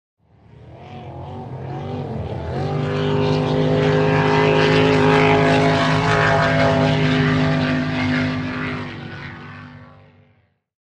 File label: Power Boat By Fast, off shore power boat